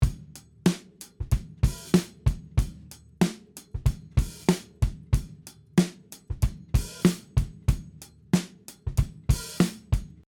94 BPM

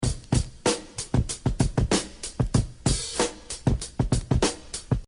Synthetic Drum break

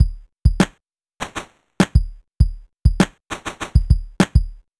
Drum Effect Bit Crusher

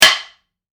Wood Hit

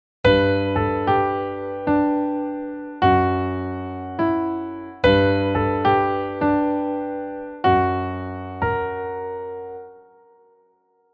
prechorus chord tones

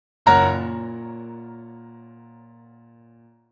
loud f sharp chord

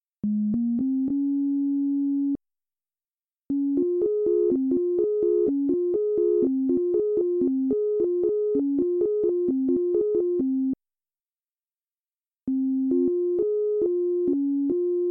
Filter Osc

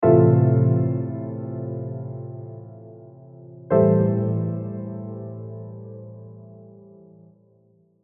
chords

